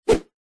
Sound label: foley whoosh whip swoosh fast; zapsplat